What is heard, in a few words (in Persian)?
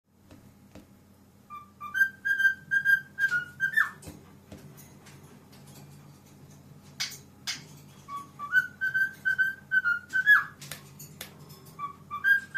آواز عروس هلندی و تقلید آهنگ If You're Happy and You Know It